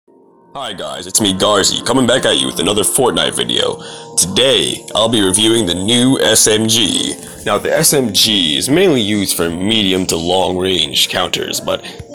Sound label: hentai noises